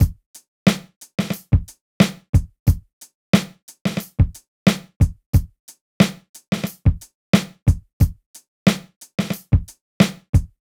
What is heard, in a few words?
Loop 90; Rock; urbangrooves